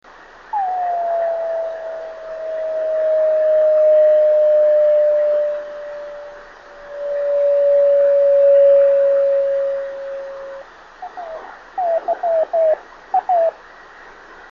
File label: 26520KHz